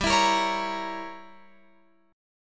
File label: G Diminished 7th, Guitar, Open F; Gdim7 strummed